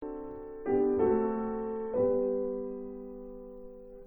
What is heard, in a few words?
piano test